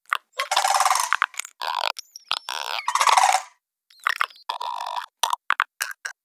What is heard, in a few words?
Swamp Jumpers Idle